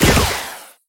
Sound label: Merged Rocket Bomb; hello kitty friends match; android